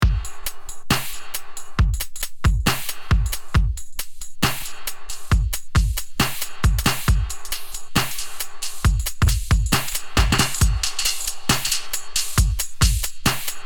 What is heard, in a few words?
dub delay sync